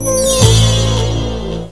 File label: win